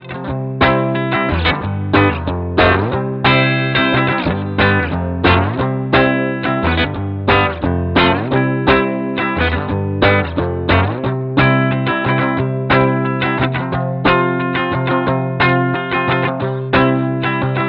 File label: Gretsch Strum